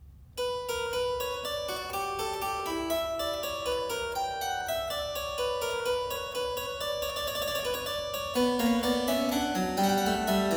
Zweistimmige Inventionen in C minor, Keyboard Works; BWV 773; J.S. Bach; Inventionen & Sinfonias